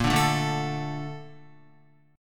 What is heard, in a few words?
A sharp Major 7th, Guitar; A#M7; strummed; JGuitar